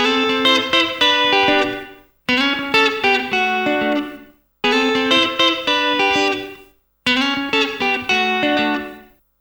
TWNSHIP, S.AFR.GTR

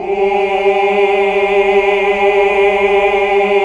OOH, Ooh Uni; Sound & Vision - Gigapack II CD 1 (Roland)

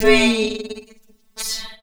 Female Stretch Vocals; Houseworx

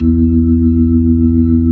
B-3, Jazz; Roland LCDP10 Keys of the 60s and 70s 2; Jazz Ch 16

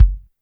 KICK, Drums Percussion; Kick 1 ModuIe

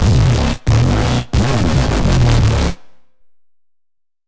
Blank neuro, bass

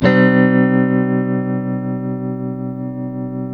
TELTMDMIN C#, TELE CHORDS, Guitars; Spectrasonics; Hans Zimmer Guitars VOL-1

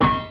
METAL

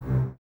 bass C 2, String Ensembles, Basses, f Legato; Miroslav Vitous; 90 s Sample CDs

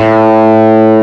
A-1 OVER D, GUITAR; AKAI S-Series CD-ROM Sound Library VOL-8

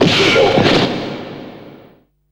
FX, Drum & Bass

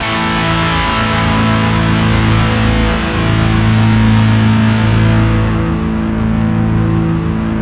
GUSH CRUSH, Bizarre Guitar; Spectrasonics; GUSHERS

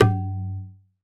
Tabla Baya; Roland BK-5; Percussion

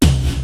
INDUSTRY HIT; Rubber Chicken Software Sample Bank; DRUMS + PERC

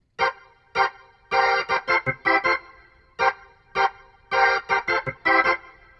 Rotor Riff, Organ; Sonic Foundry (Sony Creative Software); Joe Vitale Organ Donor

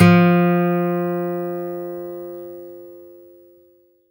HRD PICK; Quantum Leap; Guitar & Bass

